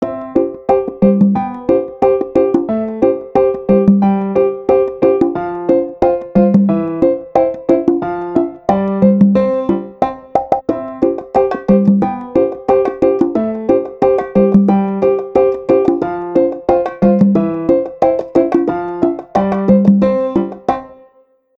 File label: spring suburbs; ZTE nubia N1; ringtones